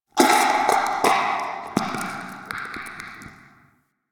interior goop distant; Ambience; Misc Indoor; DE; Warframe; Infested Eris

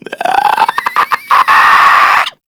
Infested Runner Chasing; Warframe; Runners

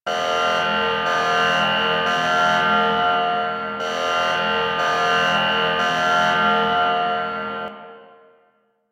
Alarm Loop; Zariman Hub Two; Warframe

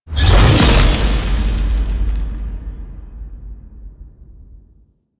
Sword Drop Down; Warframe; The New War; Teshin Intro

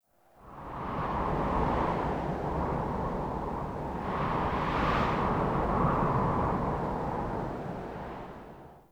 Cave Ocean Wind Gust; Ambience; Midrath Island

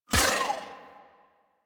Dodge Impact; Warframe; Warframes; Pacifist

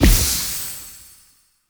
Blood Lust Hit; Powers; Fey; Founders Champion

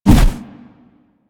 Heavy Melee Swipe C; Warframe; Weapons

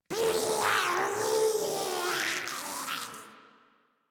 Arm Pain; en; Warframe; Grineer; Ghoul

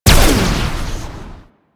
Shock Rifle Fire

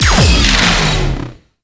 Overguard Depleted; Warframe; Eximus

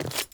Wood Step; Characters; Ambient; Steps; Sprout Farmers

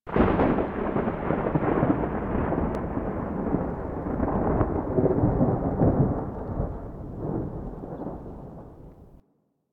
Landscape Thunder Crack, Ambience

